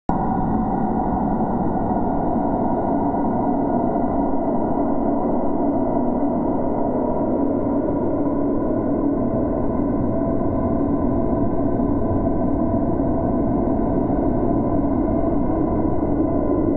ambient haze; Marc Ecko's Getting Up: Contents Under Pressure; XBOX

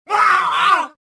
death, rodian

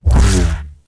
saber death

